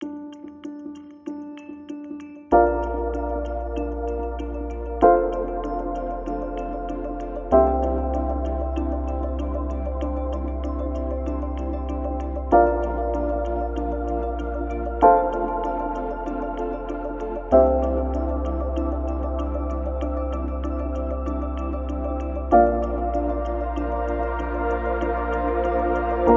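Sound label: Music Electronic Post Race 96bpm; Music Elec 2#4 (Pm Elec Post Race 2 96bpm Game Mix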